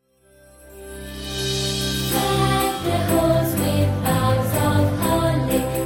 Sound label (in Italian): semifrase soprani